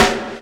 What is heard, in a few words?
SD ROOM; E-Lab; X Static Goldmine 2; akai